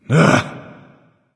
"ugh"; smite; voicepack; ullr

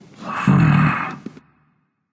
thinking, voice; Smite; ymir skin14

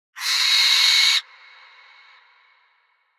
ambience bad birds; smite; conquest

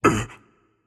surprised, voicepack; smite; jorm

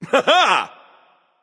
"yay"; smite; merlin

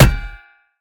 hammer hit; Smite; Bellona

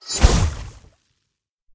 gungnir charge spear throw; smite; god odin

